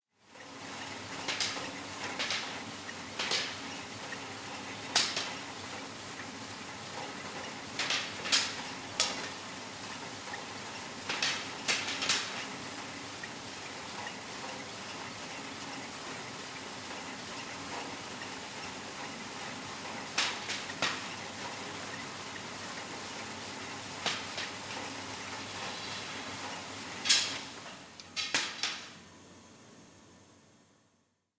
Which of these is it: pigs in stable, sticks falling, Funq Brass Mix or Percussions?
sticks falling